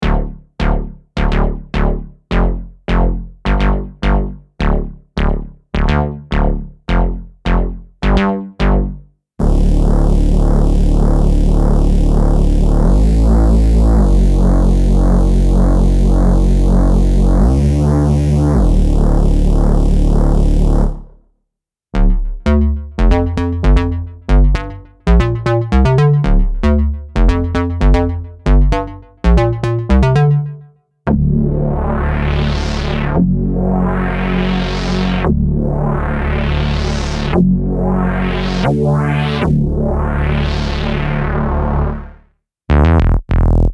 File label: synth expander mod basses